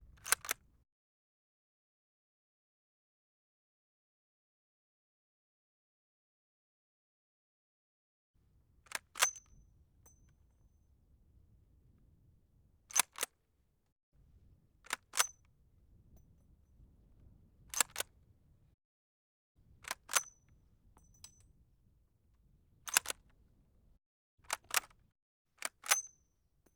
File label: M38, Handling, Various, Above, Right, Mosin-Nagant M38 bolt-action rifle; 1m; MKH8060; Pole Position